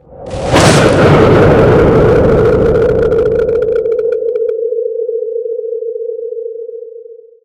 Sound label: blowout end